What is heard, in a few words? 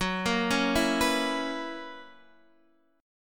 F sharp 11th F sharp down Guitar Open D